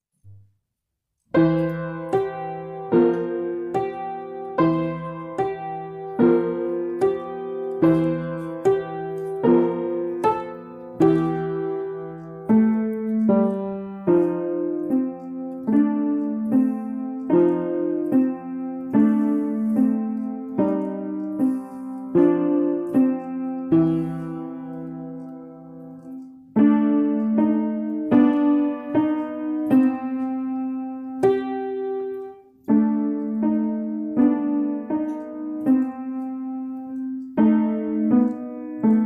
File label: Blues Prelude